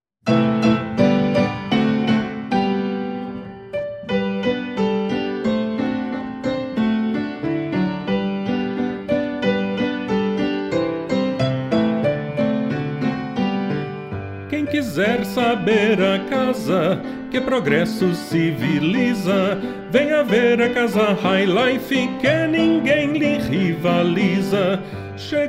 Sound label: fortepiano